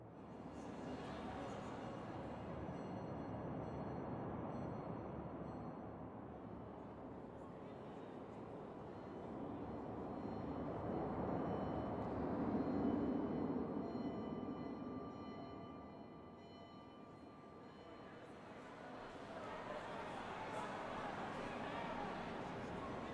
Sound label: Atmos Station